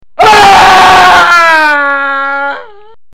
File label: ahhhahhh